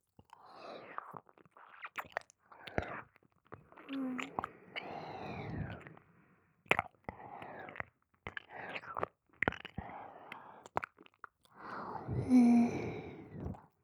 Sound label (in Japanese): 耳舐め 左, ASMR